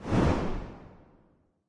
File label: swish; Smite; Zhong Kui